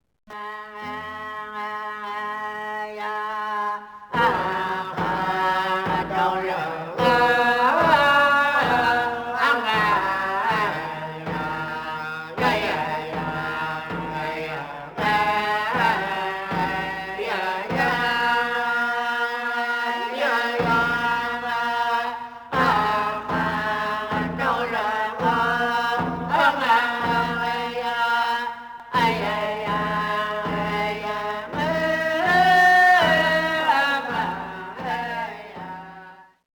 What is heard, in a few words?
stream source